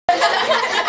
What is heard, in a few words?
Laughter